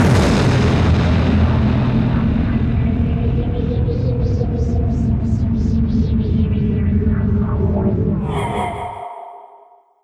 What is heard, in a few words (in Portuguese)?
Explosão